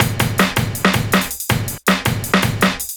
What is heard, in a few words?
drums, Hardcore Breakbeat 161 Bmin; Big Fish Audio; Hardcore Breakbeat Action